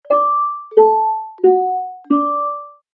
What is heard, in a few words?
lock countdown chime; nest yale lock